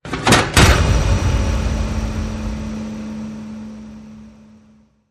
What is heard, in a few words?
Metal Latch